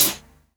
HH, Dance Mega Drums; Best Service; HH HIP

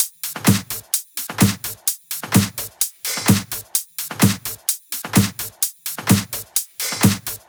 Loops 128BPM, Drumloops

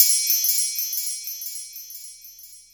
Roland JD800 Internal Drums

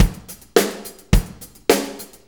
4-4 Loop, Drum Loops, Full Kit Loops; Drum Tools

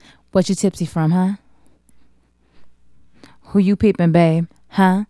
Female Solo, Hip-Hop and RnB Vocals

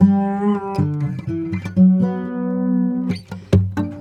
Sarangi, Acoustic String

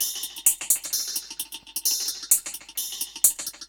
Dub Drum Kit C Wet Echo Hats 130bpm; musicradar; Dub Drums

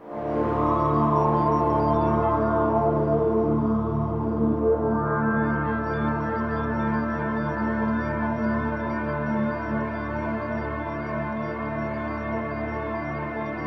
FALL OUT, AMBIENT PAD

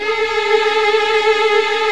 VIO MIN TRIL

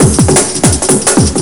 Old Skool Rave

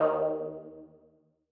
Lead D#2; KG MegaSaur

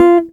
CHAPSOLO, CHAPMANSTICK; Greytsounds

